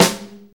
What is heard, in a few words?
crunchy steel snare drum f key, acoustic snare tuned to the F note; snare drum samples